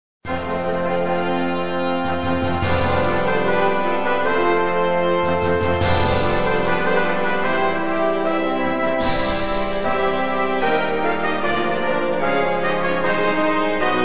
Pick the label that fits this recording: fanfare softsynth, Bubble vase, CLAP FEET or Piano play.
fanfare softsynth